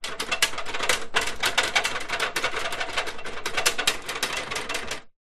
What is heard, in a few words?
industrial step ladder metal rattle shake